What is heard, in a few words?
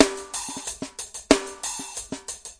92 funki sharp no bd, drum loops and breaks; 091 to 100 bpm